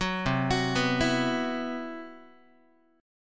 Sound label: C Major 7th Flat 5th down Guitar, chord; CM7b5 arpeggiated; JGuitar